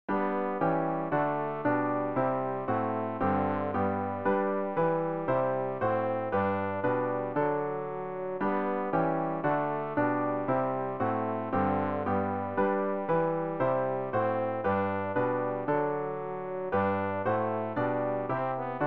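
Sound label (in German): tenor, Übehilfen für das Erlernen von Liedern; ref gesangbuch; rg 163 jesus herr und haupt der deinen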